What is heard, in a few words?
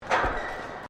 Footsteps Boots Suspended Metal Walkway Step; Footstep Foley; Footsteps (Sound Effects:Human)